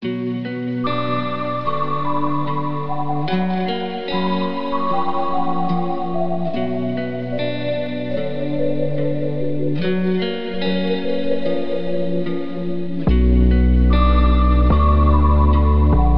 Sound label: DRIP Bm 147 BPM